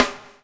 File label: BUBL, BUBBLE; Spectrasonics - Backbeat